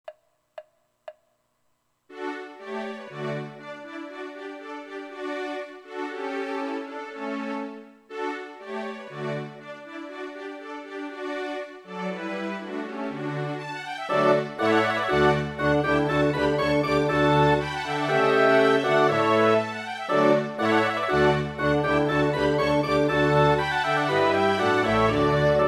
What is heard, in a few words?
fl konzert orchester; mozart